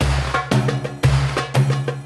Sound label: spingle Drum Loop; wild bazaar